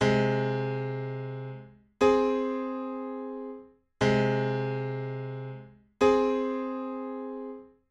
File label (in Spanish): Intervalo armonico sexta menor